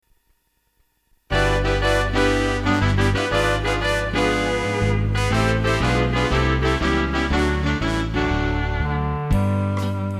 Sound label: Swing